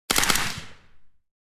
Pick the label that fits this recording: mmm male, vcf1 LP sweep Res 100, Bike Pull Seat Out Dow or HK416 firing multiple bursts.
HK416 firing multiple bursts